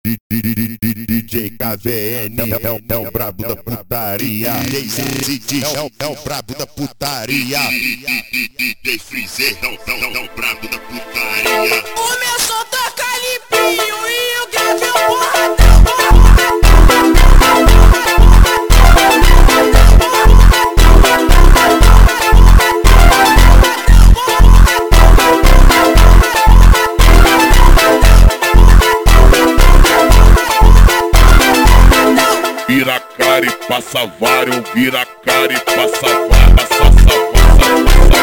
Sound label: Montagem slowed